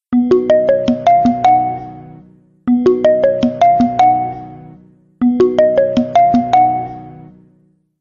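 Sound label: nokia message ringtone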